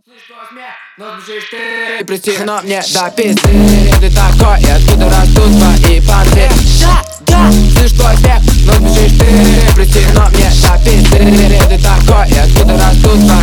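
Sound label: laughter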